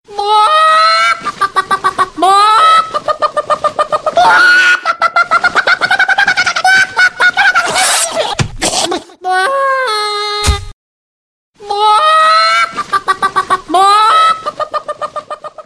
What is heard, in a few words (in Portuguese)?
galinha nervosa cacarejando